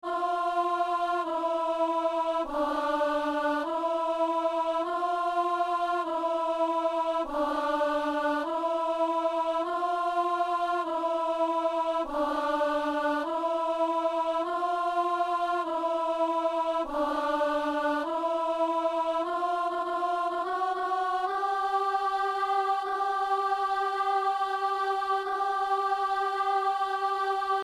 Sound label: alto; Notre Père; Messe du 17 janvier à Saint-Denis